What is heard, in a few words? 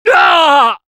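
pain severe, French; Spy; Douleur intense 1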